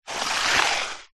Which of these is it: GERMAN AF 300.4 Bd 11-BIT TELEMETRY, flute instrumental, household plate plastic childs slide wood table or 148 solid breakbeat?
household plate plastic childs slide wood table